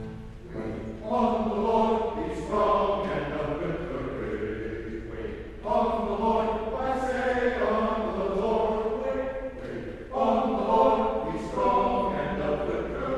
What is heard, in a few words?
Choral